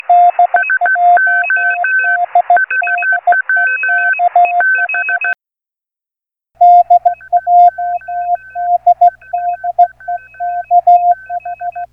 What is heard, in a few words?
CALF qrm